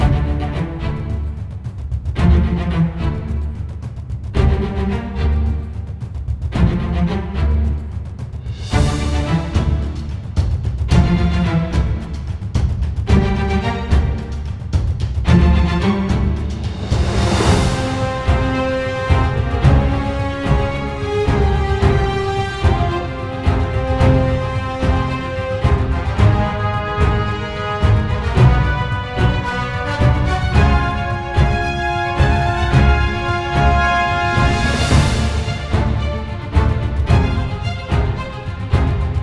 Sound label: Game Music back; Tsinghua